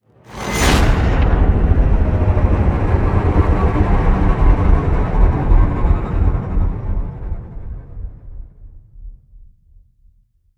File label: Stinger Modulate; extinction deluxe edition; ps4 windows xbox one